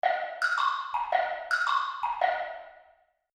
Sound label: wood block prance